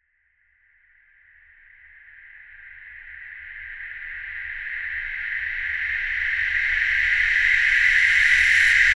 sound design reversed white noise horror climax into silence unconsciousness